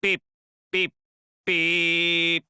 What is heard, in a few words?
beep beep beeep; monodam